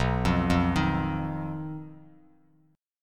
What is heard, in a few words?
B Major Flat 5th B Bass Standard; BMb5; arpeggiated; 7,8,3,8